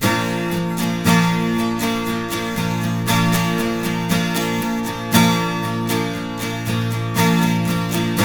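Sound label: 12 string major B, Guitars; Spectrasonics; Hans Zimmer Guitars VOL-1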